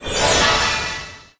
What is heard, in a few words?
random symbol transform; fairyred